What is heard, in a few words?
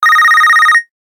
Alert Bright; Motorola RAZR-V-XT885; ringtones